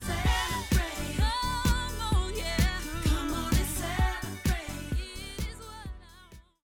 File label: Music; Star Studded Saturdays